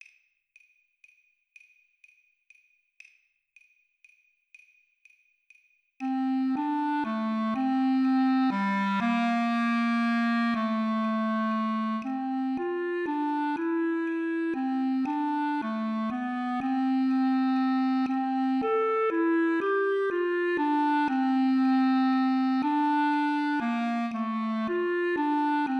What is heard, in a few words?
Bb Clarinet Play Along 40 BPM